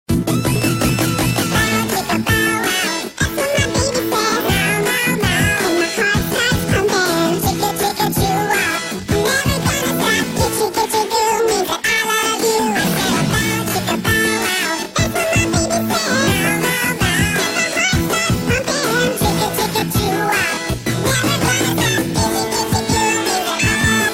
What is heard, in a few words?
ringtones children